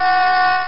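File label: admin alarm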